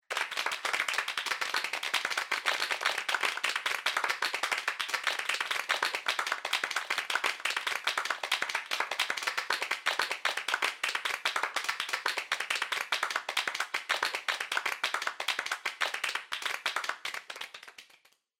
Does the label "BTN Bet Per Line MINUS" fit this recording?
No